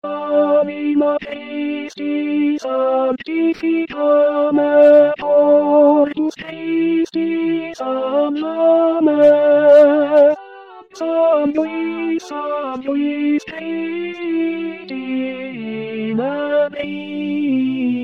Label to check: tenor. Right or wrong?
Right